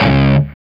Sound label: D 3, Soldano rock guitar; Spectrasonics; Hans Zimmer Guitars VOL-1